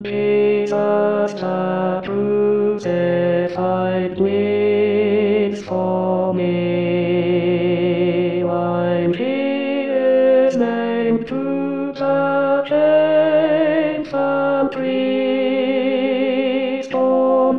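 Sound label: Jesus the crucified Tenor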